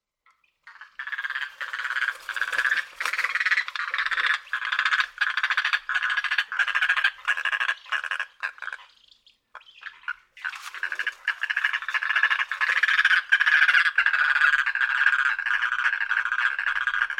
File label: Anhinga